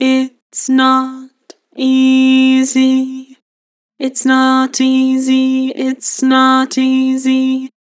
Vocals, Flat, Its not easy; Harmor

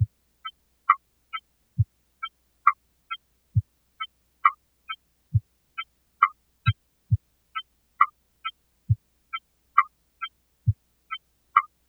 Various Melody Loop - 135 BPM C Min Drums; Loop Stems; Cymatics; 2020 Melody Collection

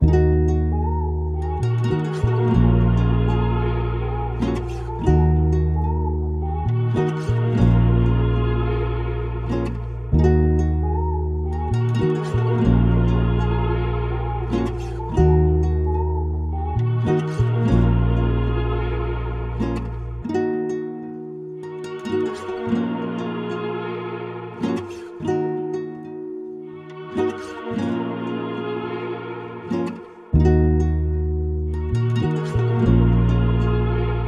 95 BPM D# Min, Hip Hop, Melody Loops; Fina

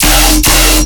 Bass Hit F, Dubstep; Necrotic Surge; Ghosthack; Demolition

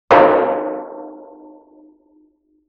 Oil Drum Hit Med Sustain; Vintage Cartoon; Drums & Percussion

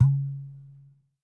Liquid Ibo - C#; percussion; Cymatics - Infinity Beta Pack 2.0; Zeus Percussion Collection

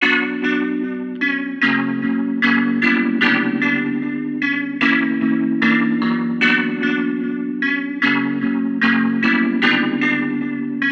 Melody Loop - 150 BPM B Min, Hip Hop; Cymatics - 9God Hip Hop Sample Pack

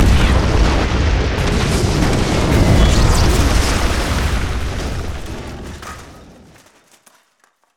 explo bomb; Explosions; PremiumBeat

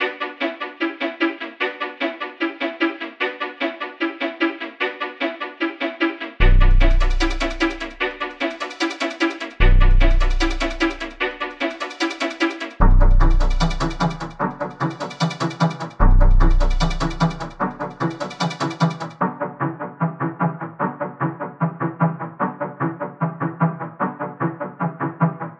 150 BPM B Min, Melody, Trap; Cymatics; 2022 Melody Collection; Trap Pt 2

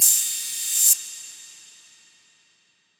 Pop Kit 130bpm Crash, Drums; EDM Drums; Drum Kits; Stems; Ghosthack; Drum Mega Bundle; Ultimate EDM Drums; Pop Kit 11

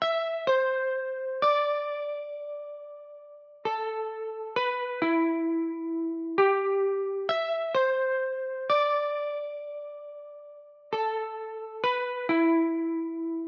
132 BPM F Min Guitar; Life

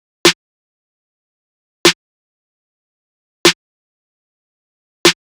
Drum Loop 150 BPM Snare, Trap; Alterations; Cymatics; ANALOG EVOLUTION Beta

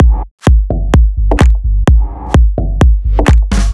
Drum Loop - 128 BPM G Min; House; Full Drum Loops; Cymatics

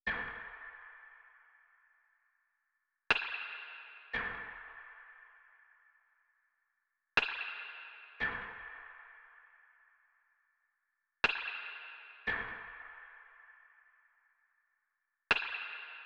Drum Loop 118 BPM Percussion; Loop Stems; Cymatics; Vibes II Drum Loop Collection; How So Drum Loop

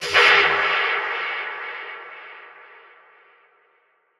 impact oneshot; GhostHack

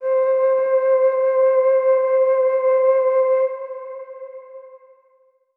INSTR Flute (C); Melodic One Shots; Cymatics; GHOST (Beta Pack)

